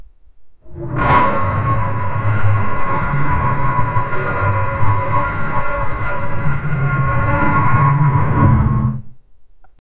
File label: metal creaking and screec; slowed down